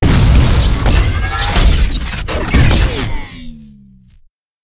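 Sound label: boss dreadnought death, monster; steamer crazy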